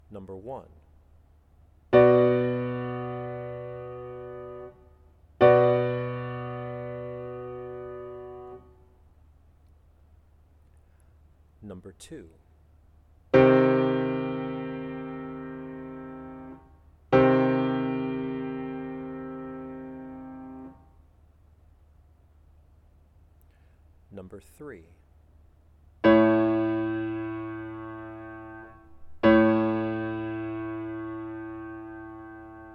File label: chords; eartraining